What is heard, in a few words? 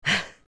Huff; Link huffing when low on health; Adult Link